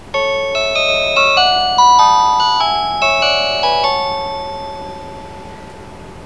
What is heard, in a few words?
chime; JR北海道キハ283(281)のチャイム(その2); その2は、肉声放送を入れるときに使っているチャイムです。基本的に終着する前に使うことが多いようですが、途中の車販案内に使うこともありました。